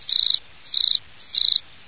cricket; source engine; hl1; video games

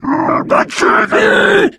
charger lurk, voice idle

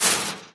chainlink, player footsteps survivor walk; source engine; left 4 dead 2

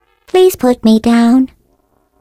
turret pickup; video games; npc; source engine; portal; turret floor

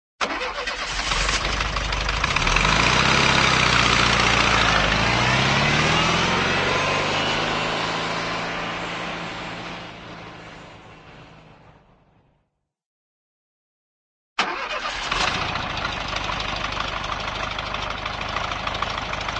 auto, vw start and pull away; cartoon

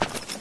player gravel; Source Engine; dod

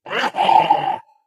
ballbot jump short

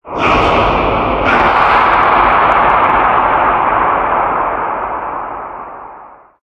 male scream, halloween; Source Engine; tf

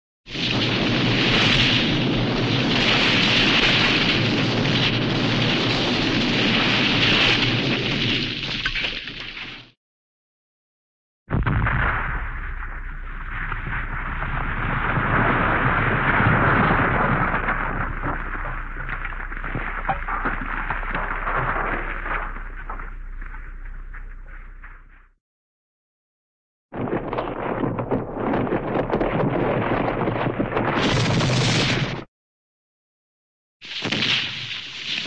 avalanche slide and rumble; cartoon

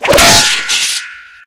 skewer

